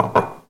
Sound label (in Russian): postavili kruzhku na stol